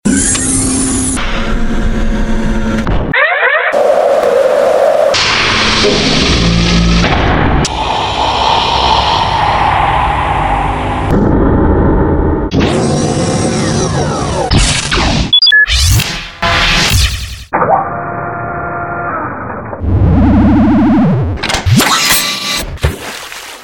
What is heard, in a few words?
robot sfx